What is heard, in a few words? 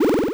MOVE; C64 Dreams; Multi-Sound Default